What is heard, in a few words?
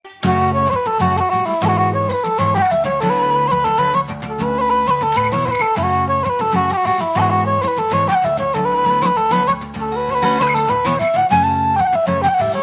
Slip Jig; Farewell to Whalley Range